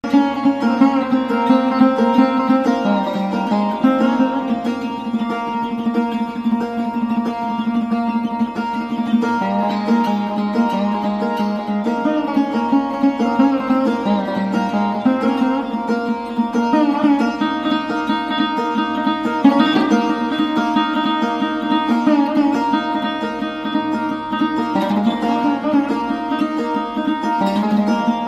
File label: sarod